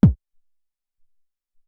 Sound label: House Kik, Alesis SR-18